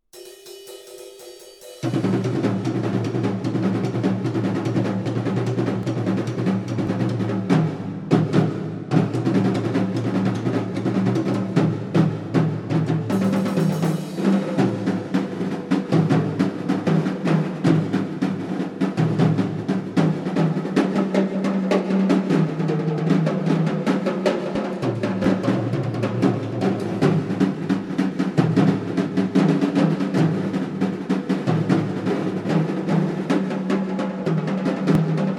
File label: Drum Jam